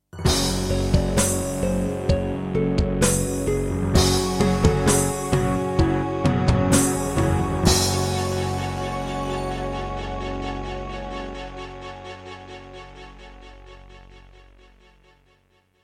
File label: Pine PT-202 Vivaldi Wavetable DB; sigil doom episode 5